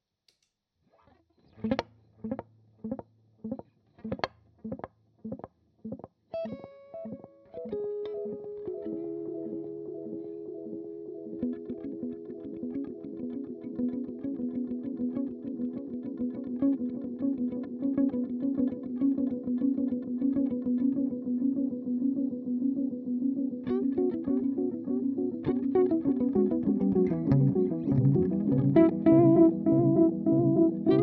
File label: Ambient Delay